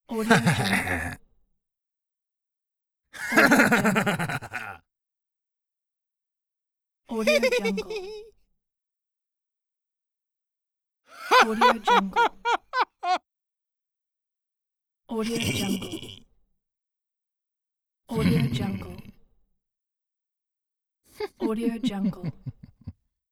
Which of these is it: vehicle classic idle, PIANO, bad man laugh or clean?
bad man laugh